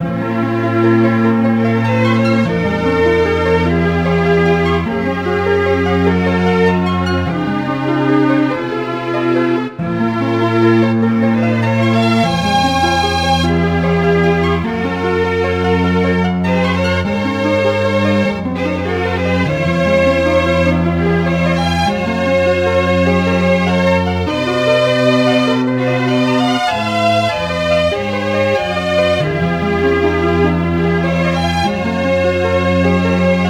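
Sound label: background music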